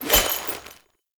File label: Fishing Spear Bait Throw; Warframe; Eidolon Fishing Spears